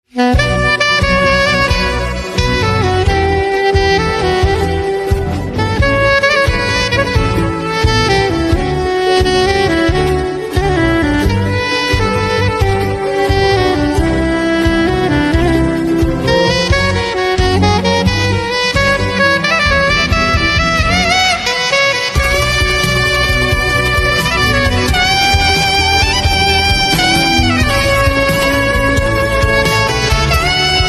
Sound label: Saxophone, instrumental ringtone